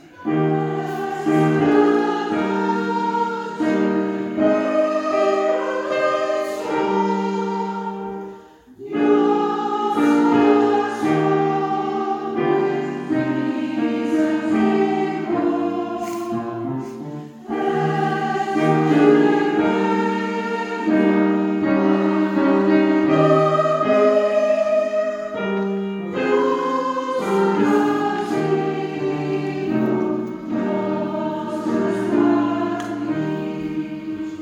Sopranen

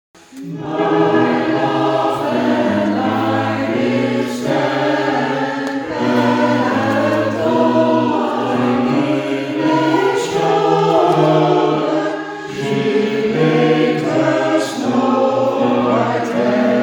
The Sally Gardens Sopraan Alt Bas

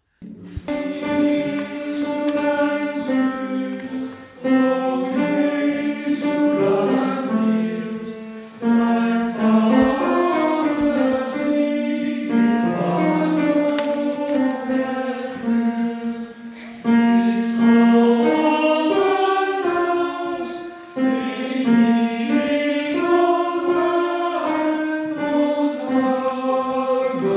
Tenor; O Jesulein Suss

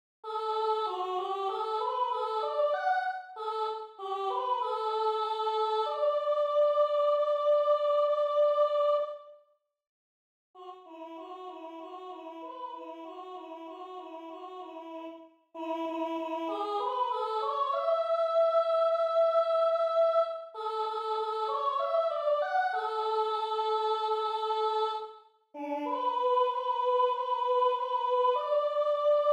Sopraan; Die Waldvogelein; Mendelssohn

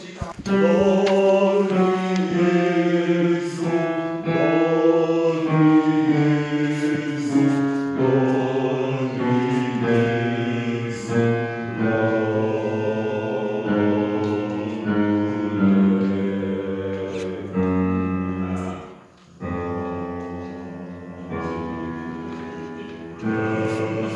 Bas; Dormi Jesu

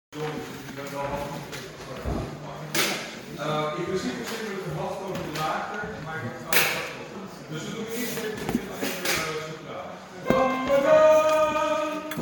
Sopraan; Come Again

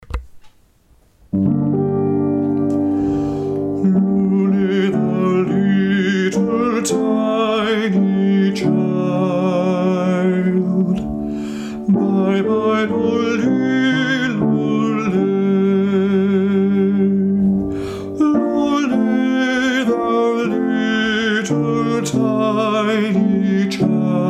Coventry Carol sopraan

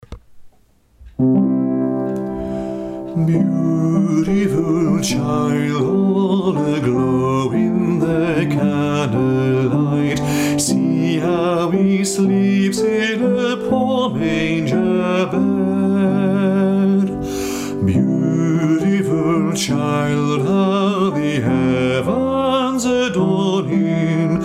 Born is the light sopraan

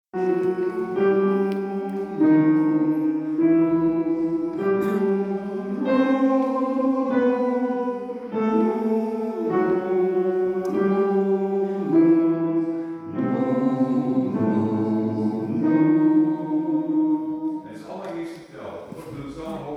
Alten; Noe Noe

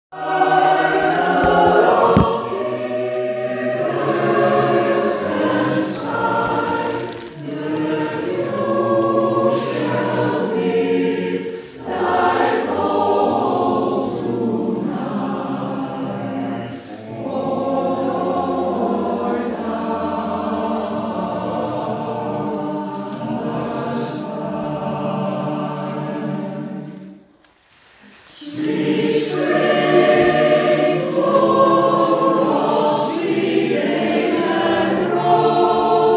Tutti

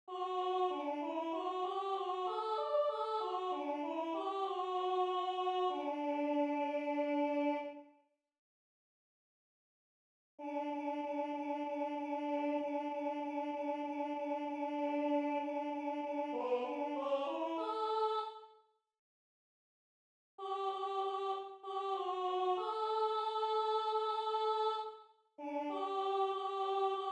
Die Waldvogelein Alt; Mendelssohn

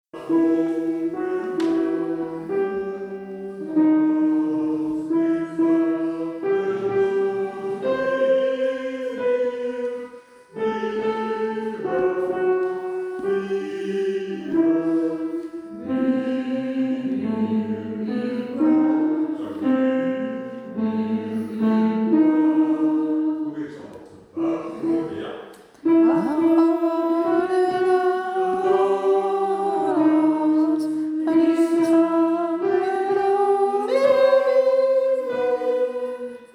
Alten; Bajo

